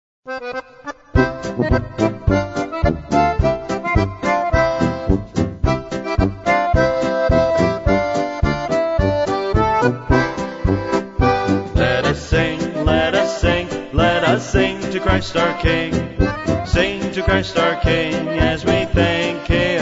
polka

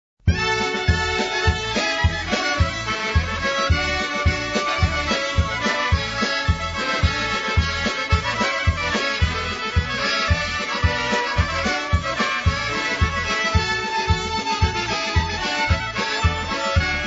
VIOLINS POLKA

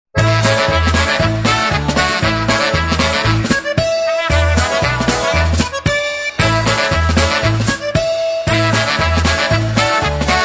POLKA

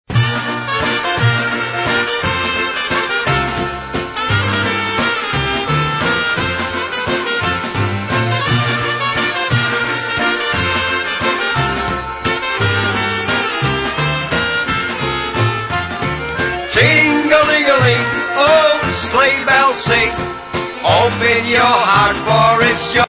sleigh bells waltz

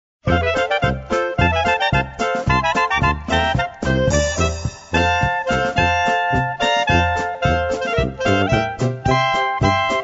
polka

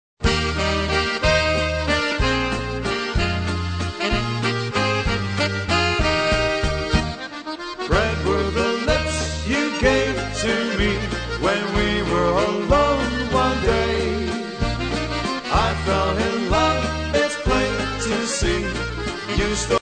WALTZ

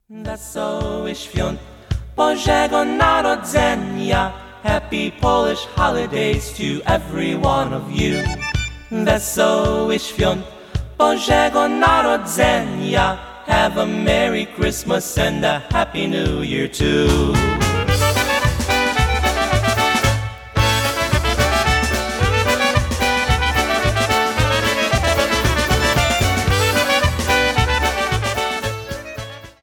Christmas Polka

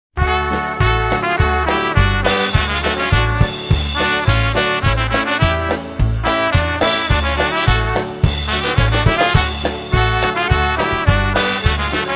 polka music

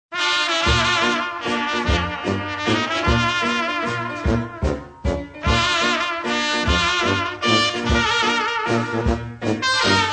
laendler

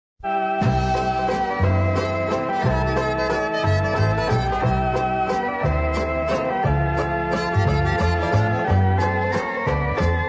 ACCORDIAN MAN WALTZ

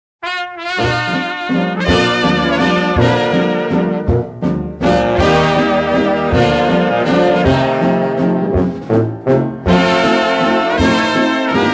WALTZ

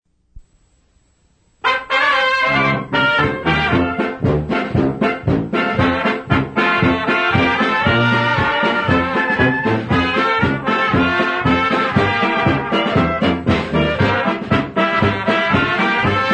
BY THE BROOK POLKA

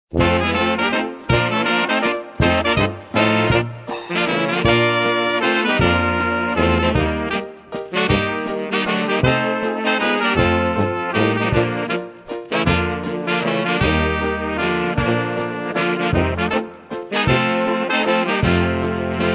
waltz

